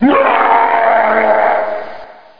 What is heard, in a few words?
death; Hexen II; archer